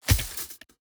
environment zone metal thread break

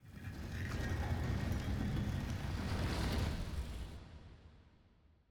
ambience room necromastery rock

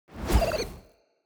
ambience room secret room wisp deploy

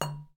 object drop bottle

